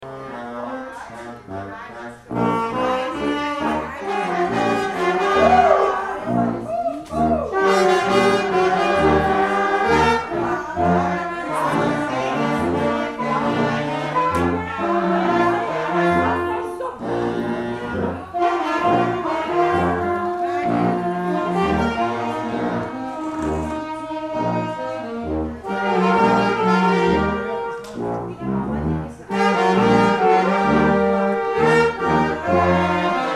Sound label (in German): guggenmusik; Albis-Chroser